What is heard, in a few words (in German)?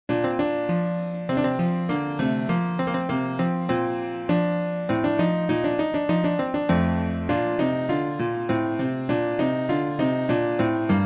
Rondo in Klavier